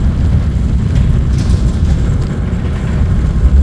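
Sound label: bridge loop; Star Wars Obi-Wan; XBOX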